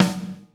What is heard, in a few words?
SNARE, ACOUSTIC KIT; Greytsounds VOL-1